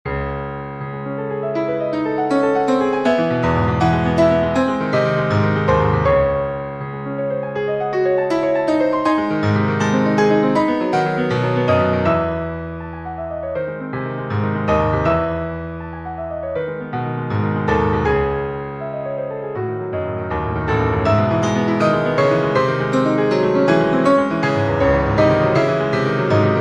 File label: Prelude C minor